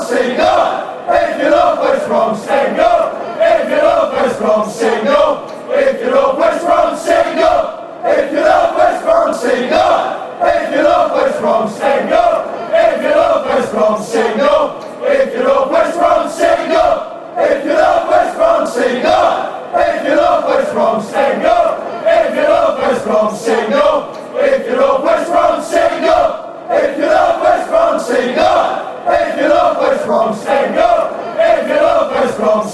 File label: sing up if you love, fanchants